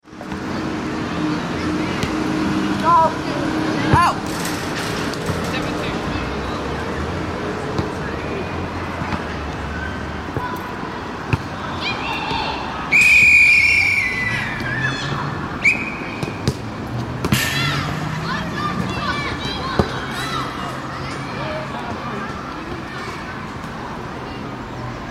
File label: Park Soccer, Soccer matches being played in enclosed area in the city of London; Sports